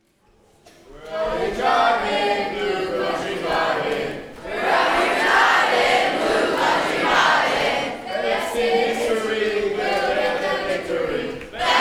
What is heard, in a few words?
Cheer